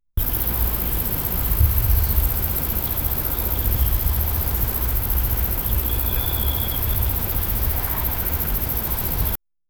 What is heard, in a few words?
light wind blowing throug, farm